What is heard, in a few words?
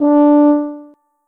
tuba note